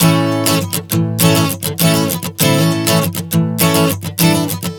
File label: Strum 100 A, One Chord Strumming 100 bpm; Acoustic Guitar